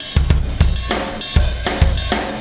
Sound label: Wormhole Beat, Processed Drumkits